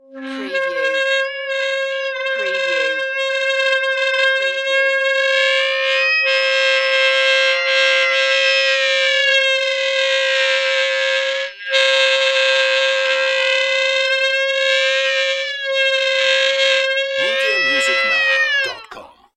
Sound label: Sax Screach